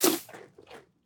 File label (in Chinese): 山羊进食声